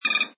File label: Tui tweet, Exotic bird - New Zealand bird Tui, short chirp.; Birds; Lynne Music